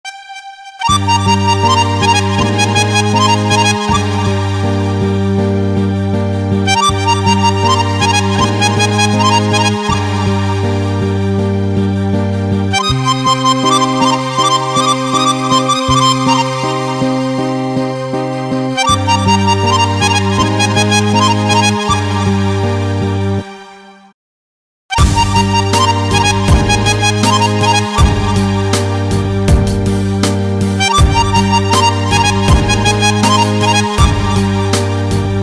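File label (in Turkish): duygusal fon muzikleri Azeri remix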